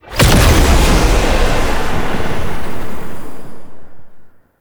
First Transformation Stinger; Enemies; Warframe; Entrati Doppelganger

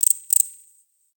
Forest Close Insect Chirp; Warframe; Grineer

